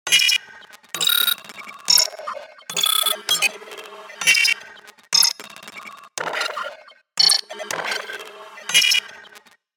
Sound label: Future Computer